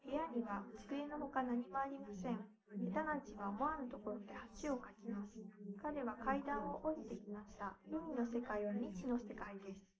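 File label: female, live recording 250ms 5cm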